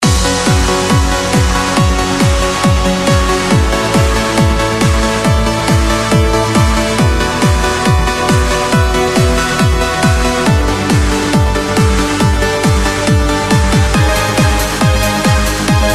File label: PEAK TIME TRANCE MIDI; PLANET SAMPLES